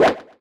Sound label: swing; HeavenStudio; lumbearjack